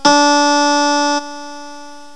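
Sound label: HARPSICHORD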